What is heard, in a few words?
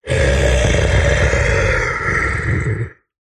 horror male zombie monster or creature soft warning growl; zapsplat